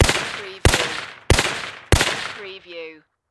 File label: Rifle M1 Carbine